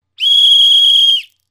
Whistle; Soundzabound

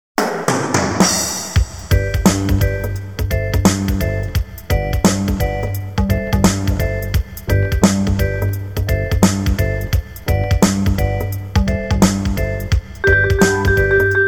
Contemporary Reggae; Soundzabound Volume 2 Disc 3

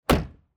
Car Door Shut

